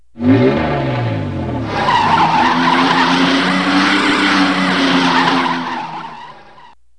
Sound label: RACECAR